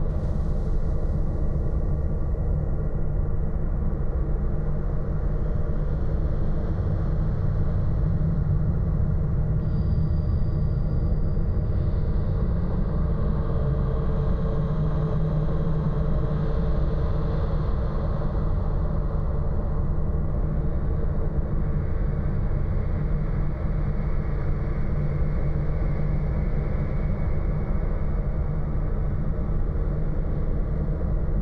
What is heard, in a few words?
Underwater Drone Horror Background Sound